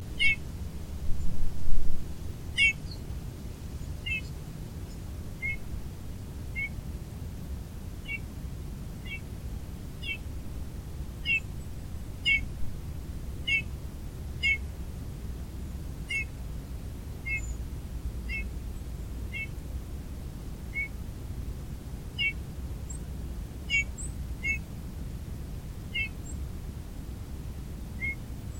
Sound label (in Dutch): Goudvink Trompet